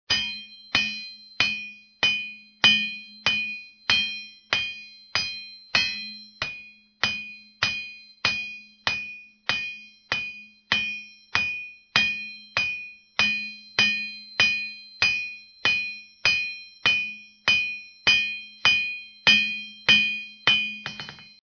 Pounding on metal

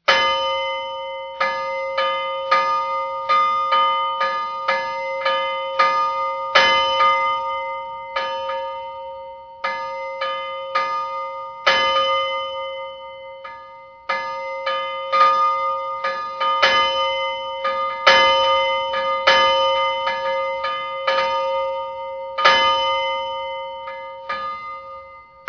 bouy bell; Lighthouses Ocean Water

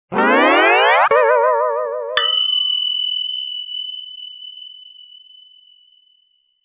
Stretching sound hits the spot

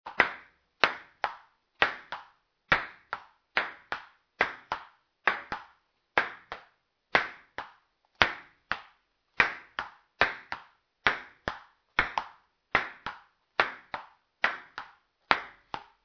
Ping pong amatures; table tennis

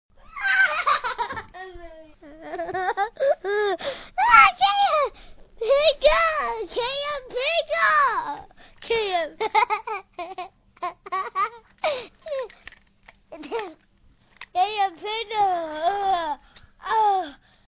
Happy kid laughing; Babies Crying Laughing

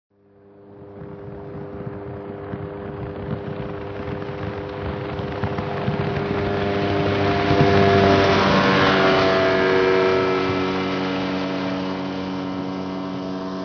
motorboat pass; boats; ships canoe rowing

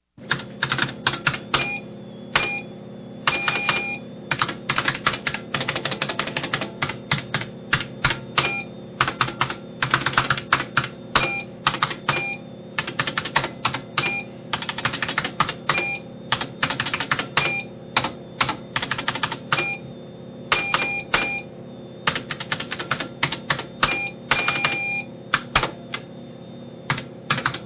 Typing with beeps; computers

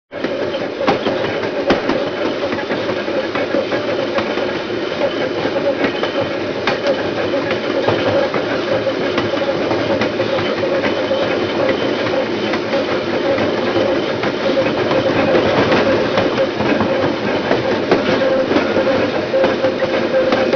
Sounds inside train

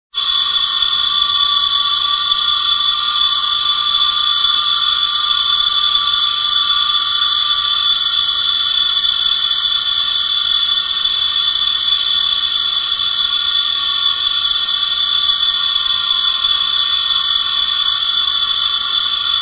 piercing sound; Halloween; Halloween Witches Ghosts Goblins Bat

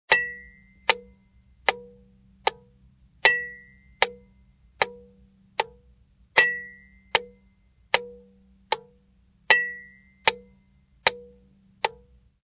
80 beats per minute metronome 80 BPM bell; pendulum

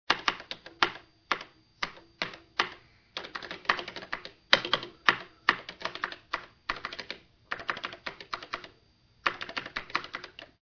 Typing on keyboard; computers

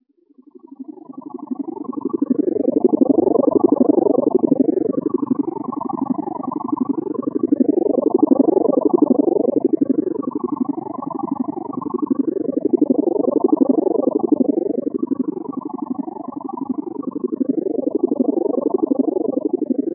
Hovering UFO

